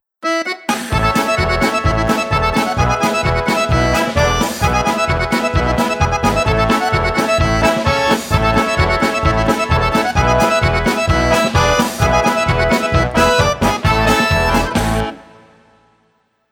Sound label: funny tiroler polka